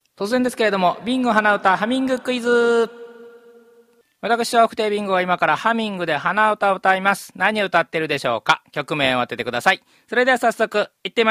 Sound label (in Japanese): hanauta